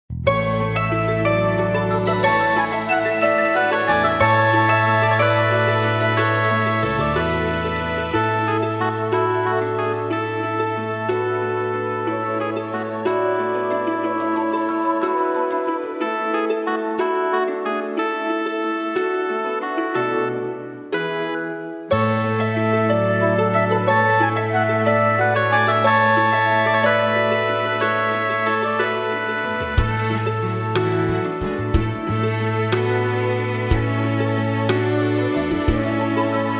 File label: Backing Track